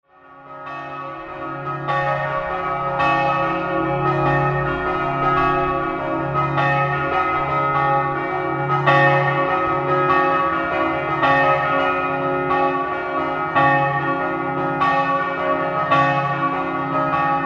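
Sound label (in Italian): Campolessi scampanottata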